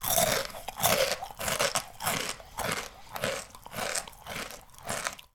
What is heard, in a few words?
human eating cereal malted wheats close up crunching